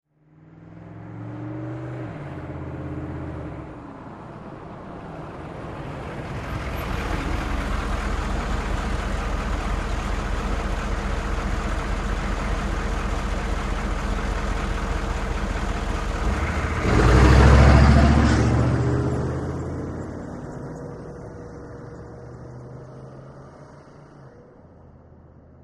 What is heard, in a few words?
Humvee: Fast In, Idle And Away.